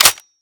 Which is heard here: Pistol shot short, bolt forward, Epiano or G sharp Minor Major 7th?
bolt forward